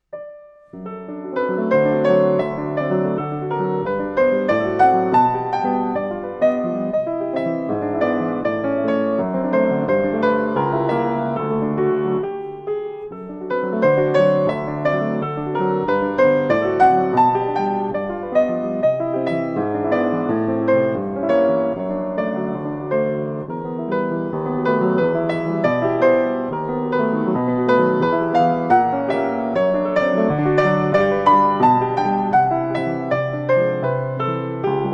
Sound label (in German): Melodie in G Dur Allegro molto vivace